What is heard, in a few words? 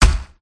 forest spirit attack contact mid